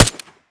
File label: mp5 navy; survivor; ZP CRISTIAN; cstrike